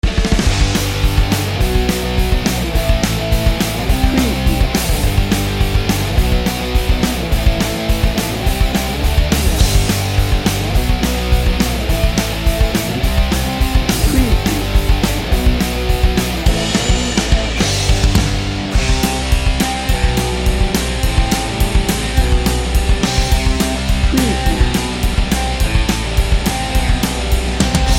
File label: pop rock revival bed